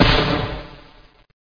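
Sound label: weapon; PC Gamer IT CD 15; bgw demo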